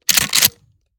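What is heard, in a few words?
raise first chamber, shotgun; reloads; iw8; romeo870; gmod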